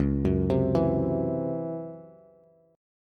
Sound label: C sharp Minor Major 7th Double Flat 5th, Bass